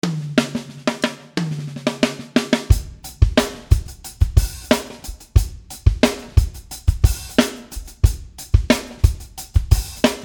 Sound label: Dusty Beats and Fills